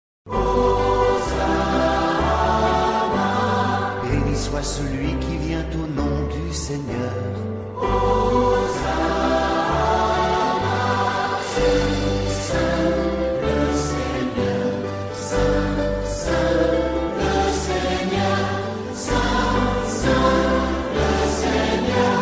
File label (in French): Chant pour la fete des Rameaux; Hosanna Saint le Seigneur